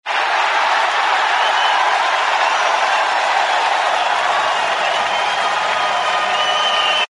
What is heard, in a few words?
football crowd cheering with horns